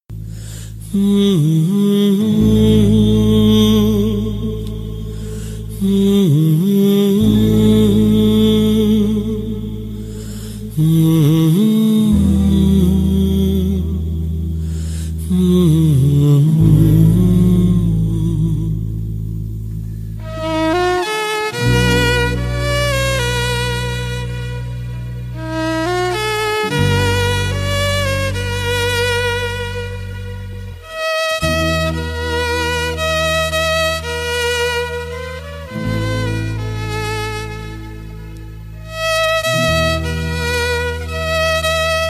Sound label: humming love failure ringtone